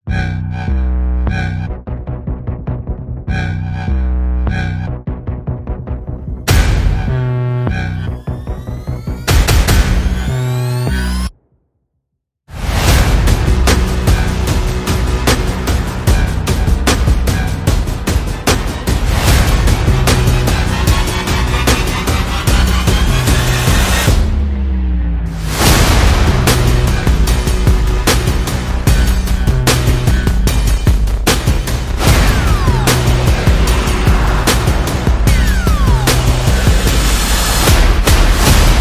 percussion bass and effects

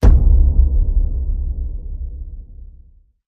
Hit Low Bass Reverb

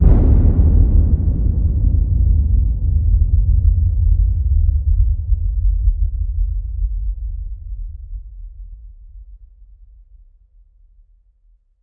Hit Low Incoming Storm

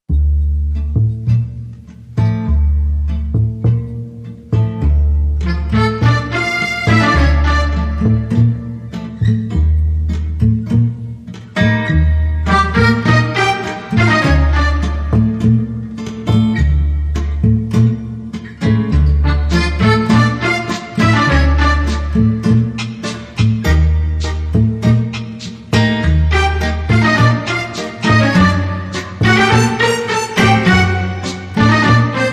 Tango

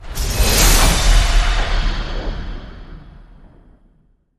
Flight Envelope

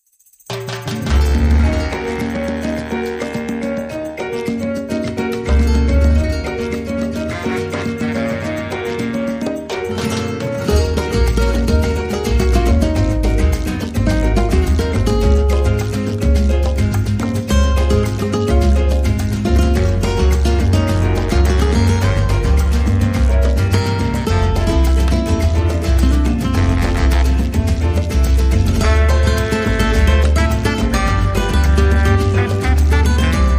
Salsa, No Trumpet

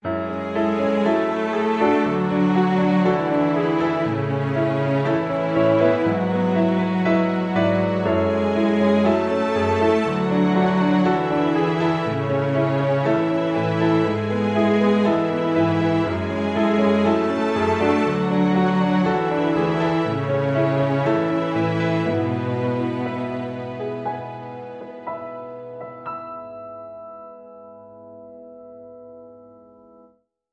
Piano Strings

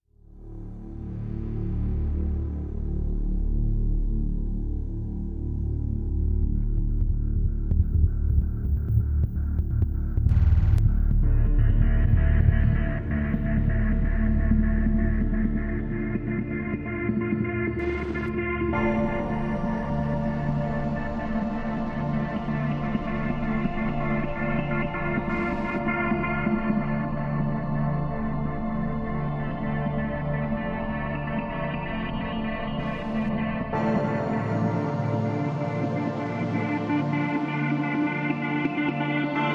Lift Off Drone No Rhythm

